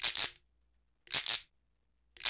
BRKDR, Reggae; Spectrasonics - Supreme Beats - World Dance

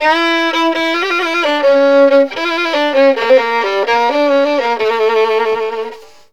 VIOLIN; USB Soundscan vol.63; AKAI

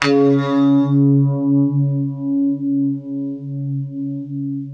UNIVIB C#2, Guitar; East West; Steve Stevens Guitar Samples Collection

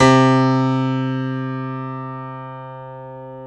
PNO, Grand Pno; Club-50 - Foundations Roland

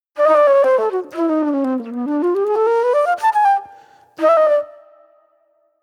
Sweet Soul Flute; Sonic Foundry (Sony Creative Software); Manhattan Flutes; Good Times

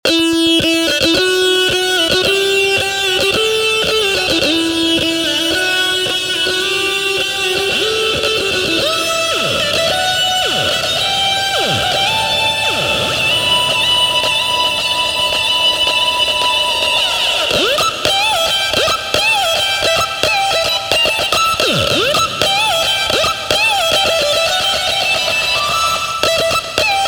lead

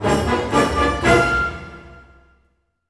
Orchestral Loops; 128 STRAVNSKY

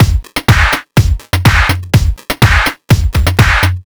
124 Wubblefunk Full, Drum Loops; 124bpm; Nu Dance Electro

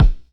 Hammer Hard And Deep, Jomox Kicks; Airwindows

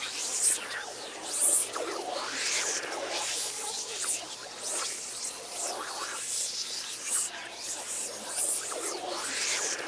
WHISP REV, whispers

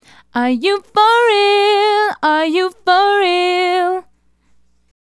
Female Solo, Vocal

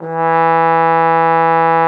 TROMBONE E3; Xsample VOL-3

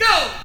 VOX SHORTS; Megabass Remix! 2

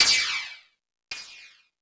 Crash 2, drum machine

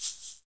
Shakers

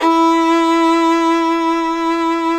FIDDLE NOTES, E4, L

